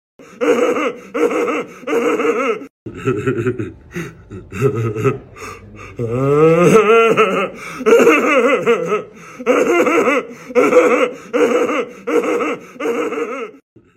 funny laugh; android

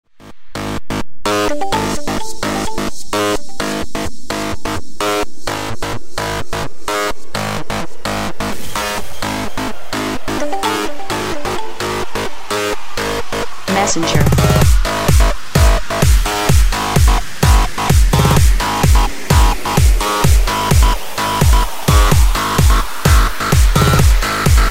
messenger techno ringtone; android

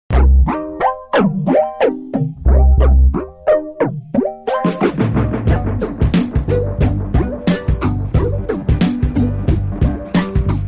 loud ringtone; bojack horseman

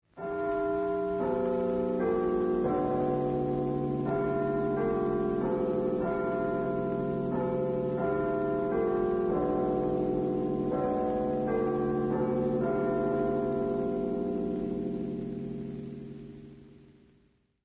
big ben ringtone

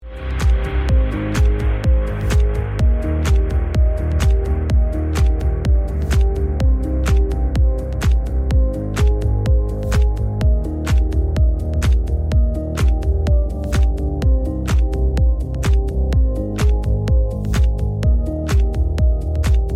salzburg ringtone

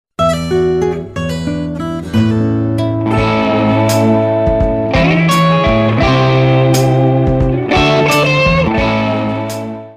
nokia rock ringtone

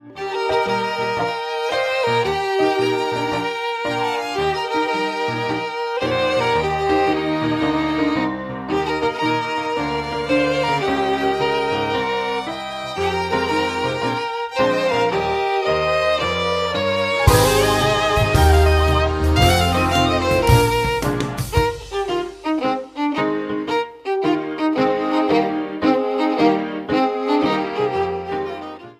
violin ringtone

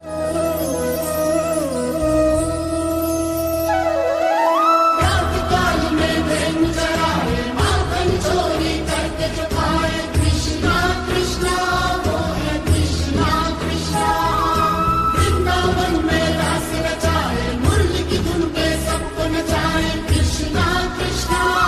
krisna flute ring ringtone; android

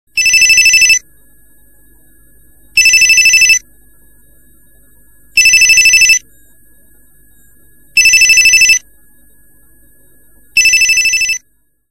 panasonic ringtone

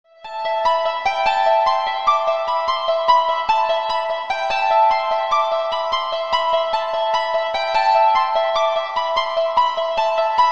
tubular bells ringtone; android

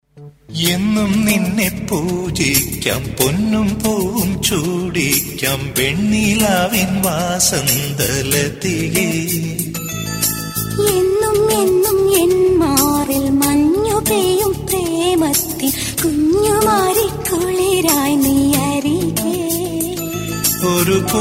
love song malayalam ringtone

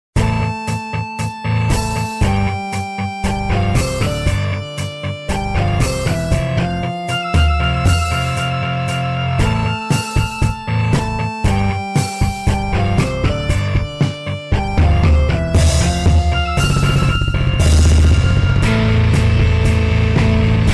new samsung tone ringtone; samsung galaxy

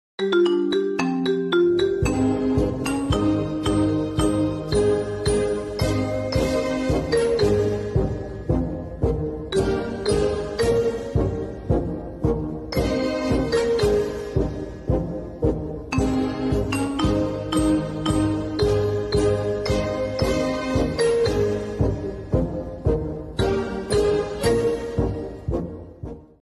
erika marimba ringtone

